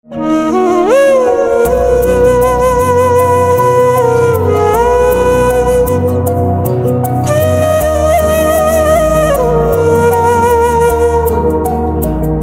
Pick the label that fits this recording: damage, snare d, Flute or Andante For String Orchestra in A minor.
Flute